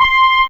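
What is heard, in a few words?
Trombone Mid C4, Fairlight CMI IIe Brass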